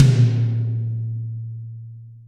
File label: toms, Real Mega Drums